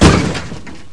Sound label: damage, car; q3rally